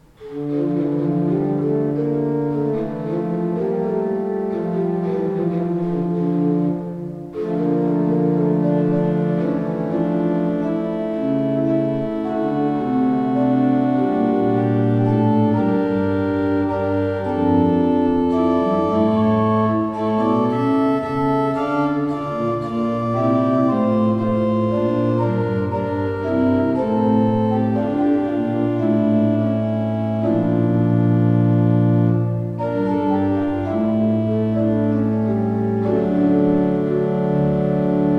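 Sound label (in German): Merkensteiner Orgelbau 19 II P, pfeifenorgeln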